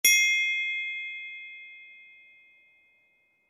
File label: Musical Accent, Musical Accent Glockenspiel Chime; Mallet Sound Effects; Cartoon; Blastwave